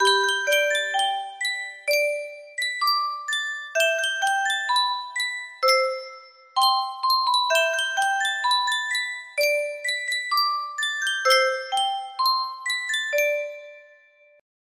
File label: Sankyo Music Box, music box melody